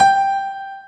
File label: AC PIANO; PIANOS+KEYS; Pro-Rec - Synthsonic